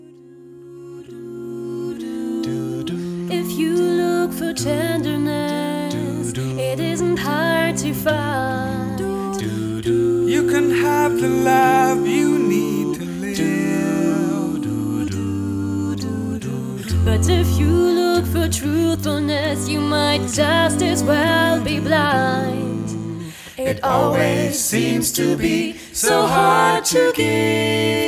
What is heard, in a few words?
Pop Ballad short